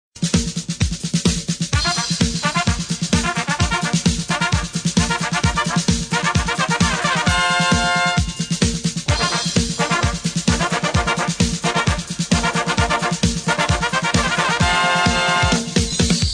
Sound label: Trumpet Cross Short